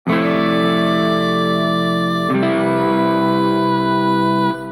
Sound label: chord name include melody